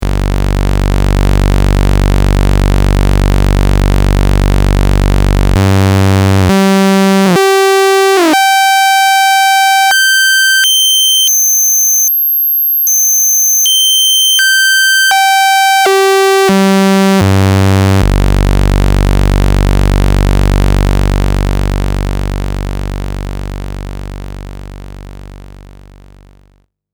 Osc Saw

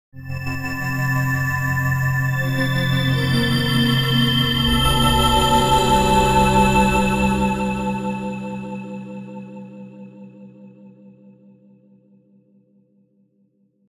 Whisp

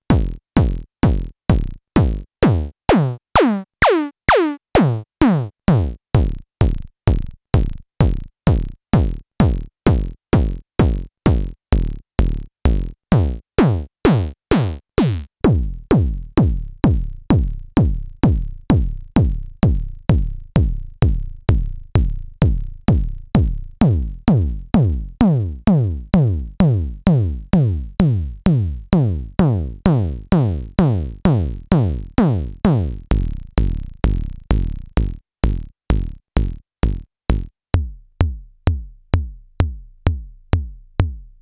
String BD Engine; MODOR DR 2

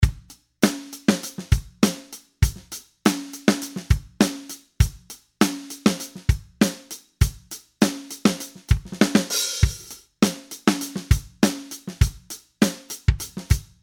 Presonus Studiolive ar22 usb Drums dry

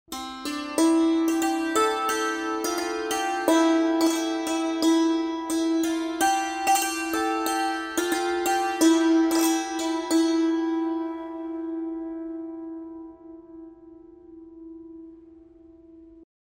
Santoor 90bpm

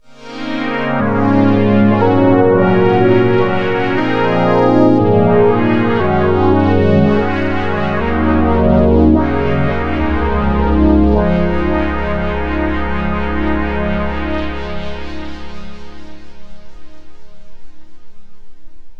Softube Model 84 Sad Pad

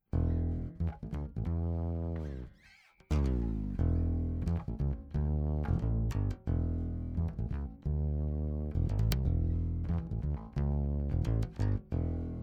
High Z In Bass Godin Fretless Pad 15dB; Millennia HV35

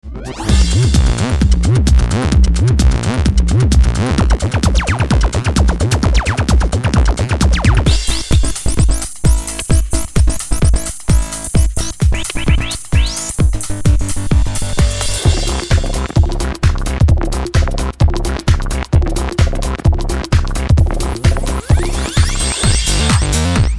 Analog Attack

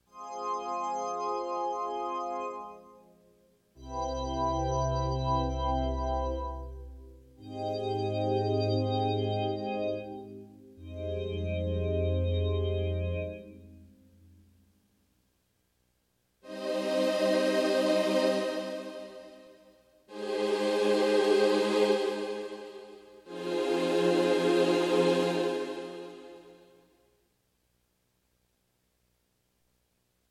Synth Voices

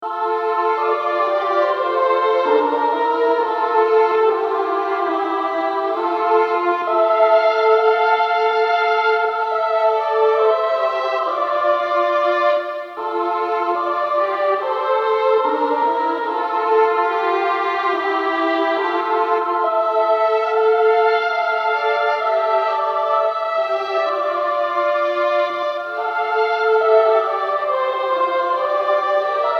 Mellotron Micro Boys Choir Bassflute Strings High

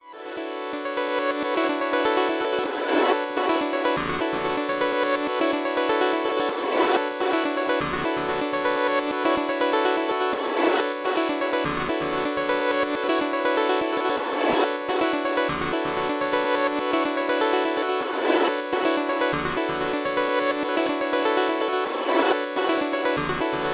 Time Travel; 1010music; fxbox

